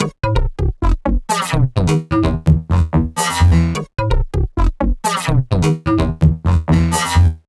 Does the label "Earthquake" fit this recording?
No